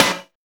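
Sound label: GRIND SNARE; Drums In Your FACE!; NEWJACK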